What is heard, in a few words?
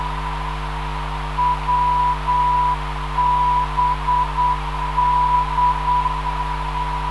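WBD 399kHz, non-directional beacon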